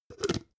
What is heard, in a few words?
jar glass plastic close cap